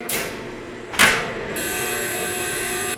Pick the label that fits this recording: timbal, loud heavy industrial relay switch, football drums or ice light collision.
loud heavy industrial relay switch